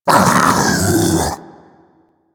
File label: Infested Splitter Vocal Alerted; Warframe; Grey Strain